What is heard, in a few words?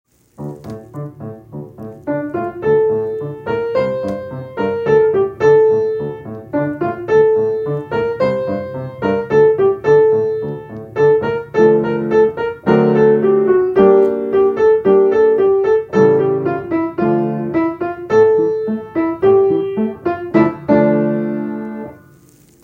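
Don Gato, Piano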